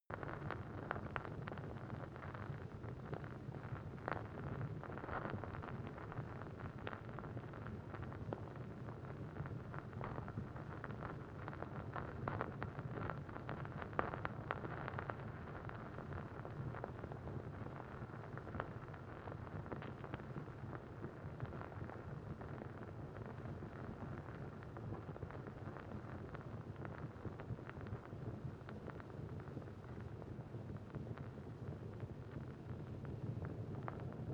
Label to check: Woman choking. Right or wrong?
Wrong